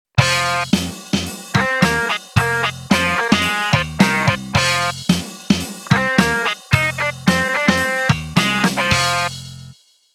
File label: Casio SA 80; Song aus Casio Sounds: 99 Drums, 37 Bass & 30 Gitarre